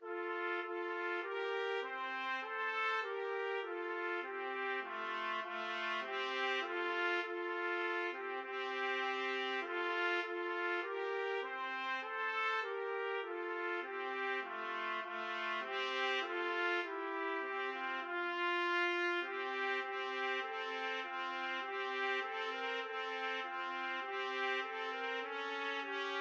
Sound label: ode to joy trumpet duet